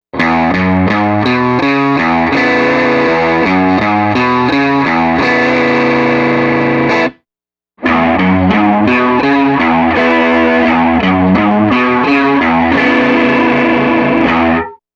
chorus, Stereo Chorus; Retro Sonic

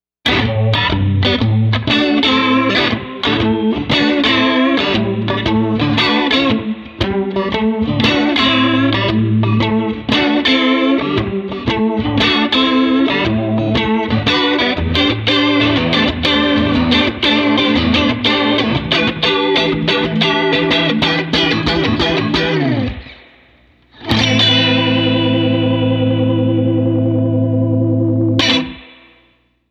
chorus, Stereo Chorus; Retro Sonic

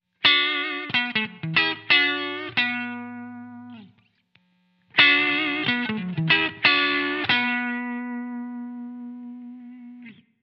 push; Damage Control

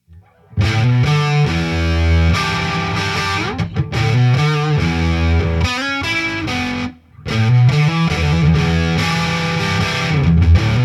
Peachfuzz Medium Gain; pedal; FRANTONE

